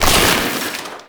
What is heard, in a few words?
Debris Fabric; Ambience; Gameplay; Warframe; Entrati